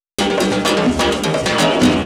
Fill 128 BPM; Vengeance Samples; VEH1 Loops

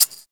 Shaker; Vengeance Samples; VEH1 Percussion

